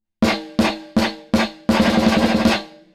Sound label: Spring decay 0.00 s